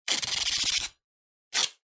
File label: Duct Tape Pull Rip